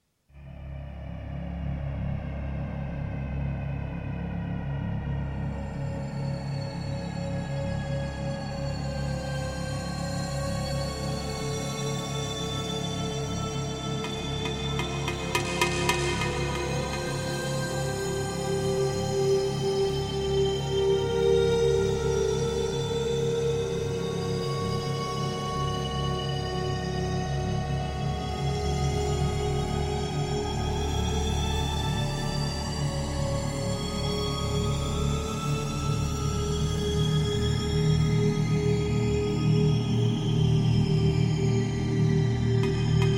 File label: Music; Theron's Quest; PC Engine